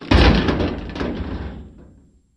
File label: Garbage Can Impact V